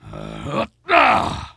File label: Attack Light; Putress